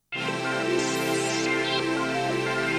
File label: Instrumental